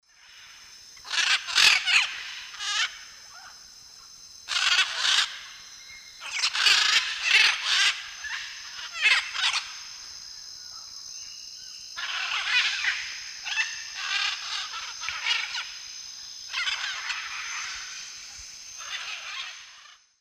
Ara ararauna, flight calls; S. America; Eastern Lowlands

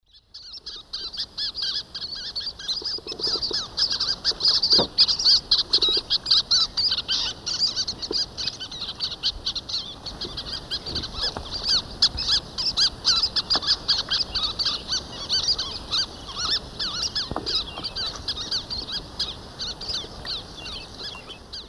Sternula superciliaris, flight calls; S. America; Eastern Lowlands

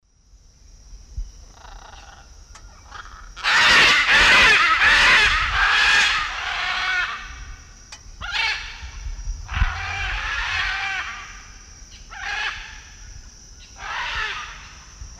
Ara ararauna, take-off calls; S. America; Eastern Lowlands

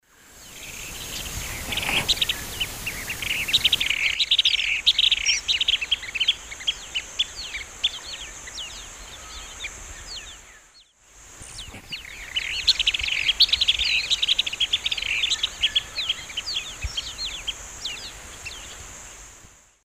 Charadrius collaris, chase calls; S. America; Eastern Lowlands

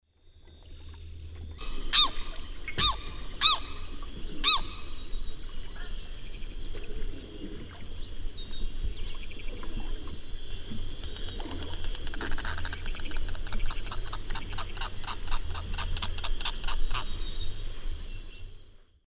Aramus guarauna, calls; S. America; Eastern Lowlands

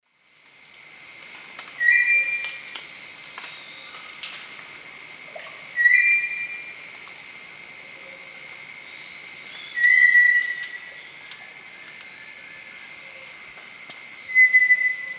Eurypyga helias, song; S. America; Eastern Lowlands

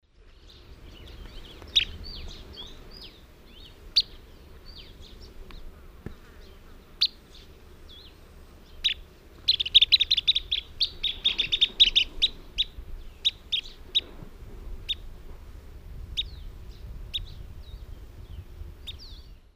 Charadrius collaris, calls and flight calls; S. America; Eastern Lowlands

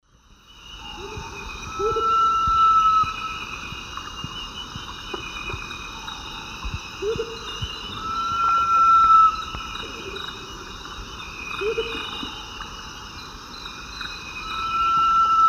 Eurypyga helias, song; S. America; Eastern Lowlands

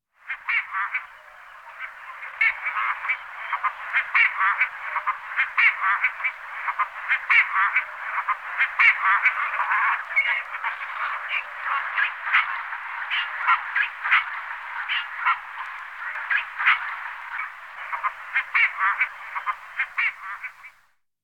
Phalacrocorax pygmaeus; All the bird songs of Britain and Europe; Jean-Claude Roche

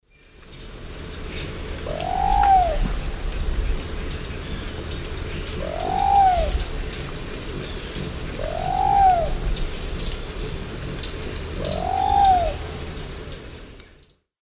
Patagioenas subvinacea, call; S. America; Eastern Lowlands

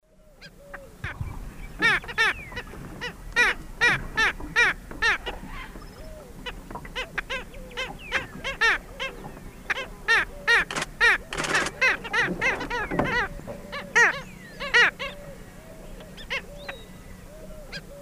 Rynchops niger, calls; S. America; Eastern Lowlands

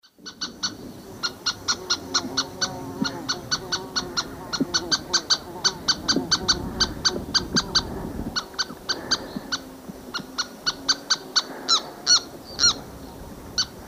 Sternula superciliaris, flight calls; S. America; Eastern Lowlands

